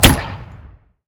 auto fire; smite; apollo v4 skin14

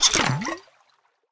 potion; Smite; Baron Samedi

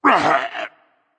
grunt hit berserk; cuchulainn

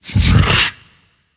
ugh; smite; ymir

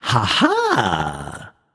nv discover ah; smite; voicepack; ganesha

